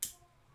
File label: switch